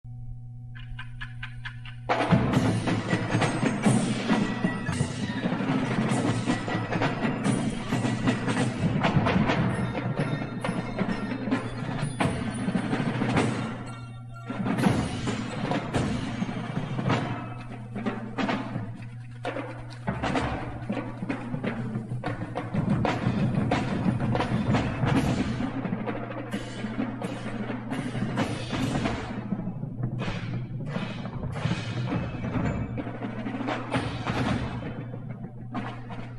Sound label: percussion solo